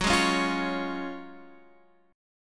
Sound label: F sharp Major 7th Suspended 2nd